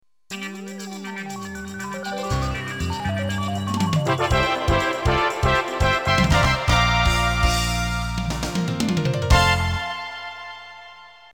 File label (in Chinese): 片头音乐